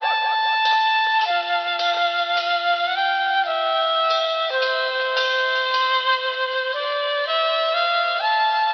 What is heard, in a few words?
flute easy going, Loops